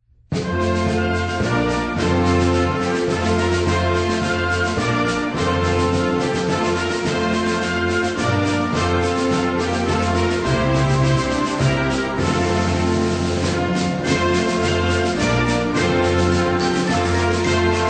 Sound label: Fanfare Dance and Choral